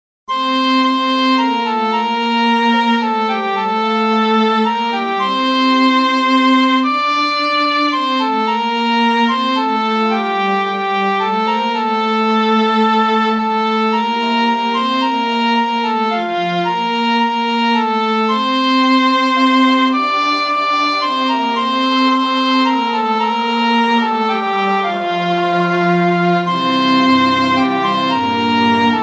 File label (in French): louez keyb